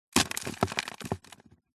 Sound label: foley dirt soil drop onto plastic upturned tub pot recorded underneath inside